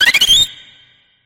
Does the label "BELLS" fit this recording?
No